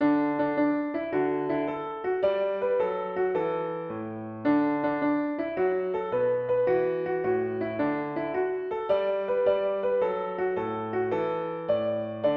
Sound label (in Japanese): ピアノ